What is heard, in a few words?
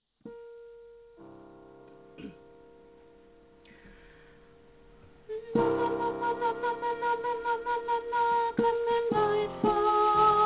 Sopran